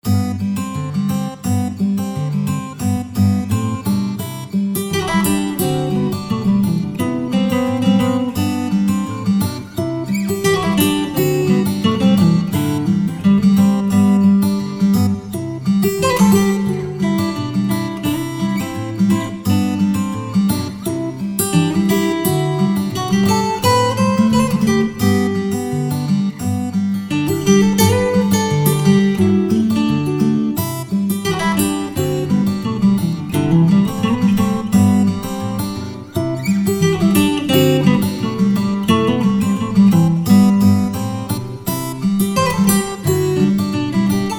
Honest Folk

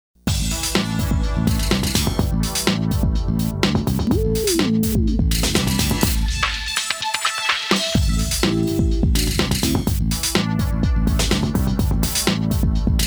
pop track

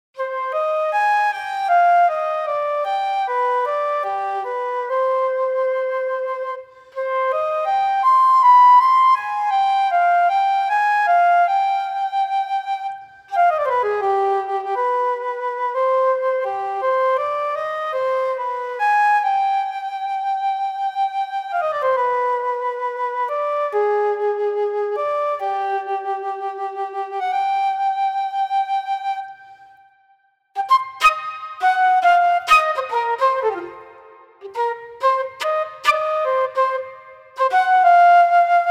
Flute legato

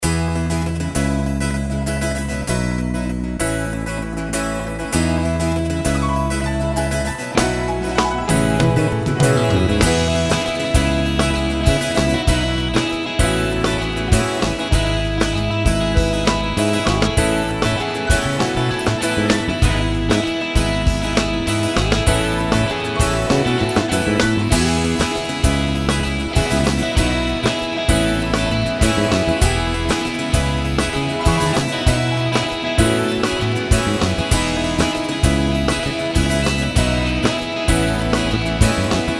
Guitar and Piano Americana

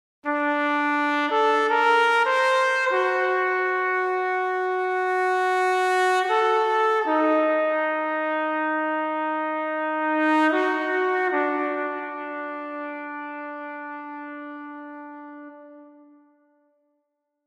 Solo Horn Film Noir

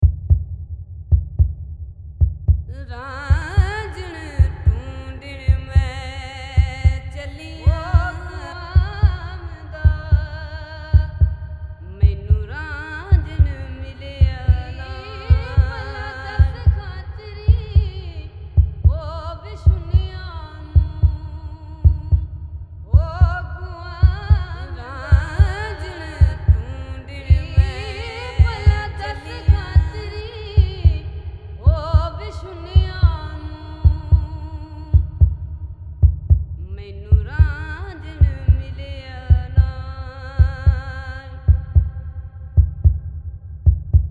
native voices with heartbeat

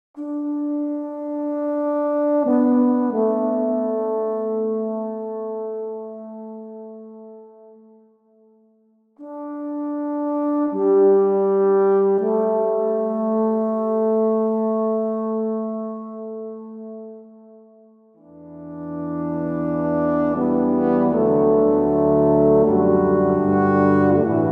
Quiet Horns

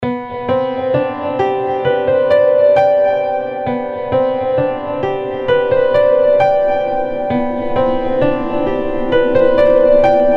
Practice Piano; on the Spacecraft

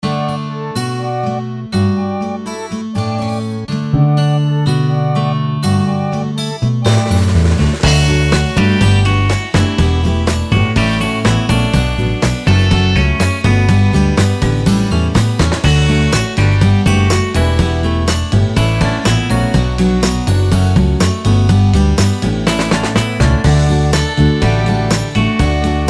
Sixties in 60

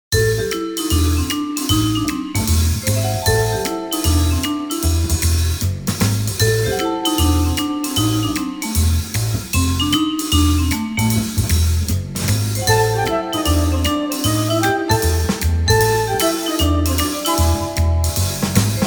Sneaky Vibes

